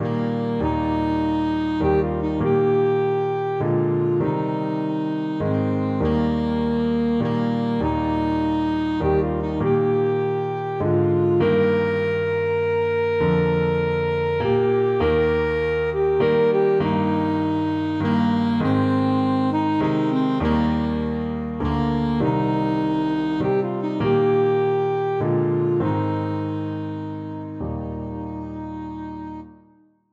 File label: saxophone